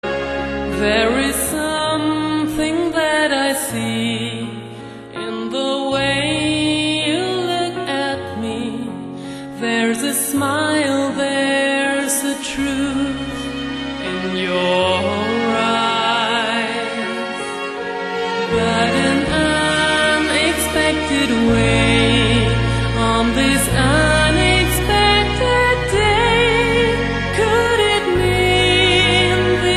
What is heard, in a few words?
Waltz